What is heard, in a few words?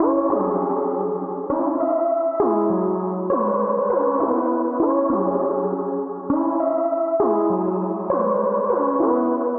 Melody Loop Synth Lead Gm 100 BPM Abstract Vintage